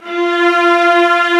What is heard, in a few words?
Vla F3; Digital Sound Factory Virtuoso